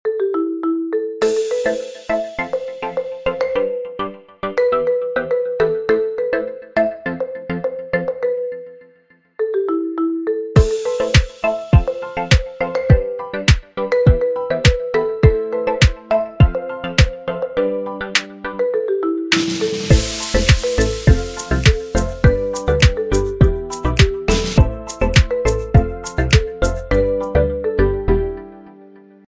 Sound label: marimba remix